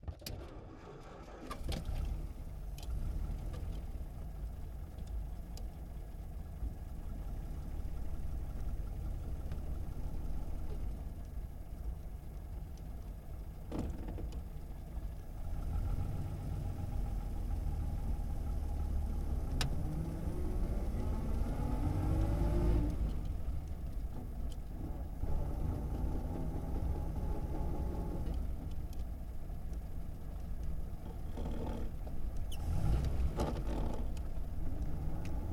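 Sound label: 1937 Plymouth Int Start Away Shift Idle, Period Vehicles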